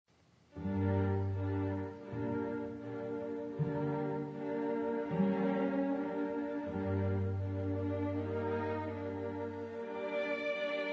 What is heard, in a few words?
Viola Quintet in g Adagio Allegro